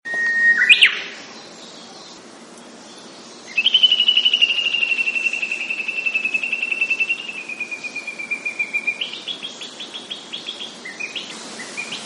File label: uguisu